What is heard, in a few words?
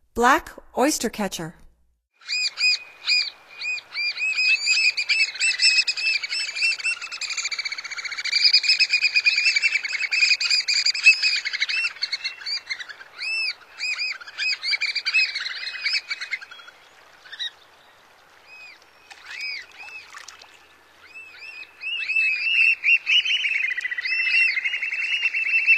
Black Oystercatcher, Songs of Birds of North America; Stokes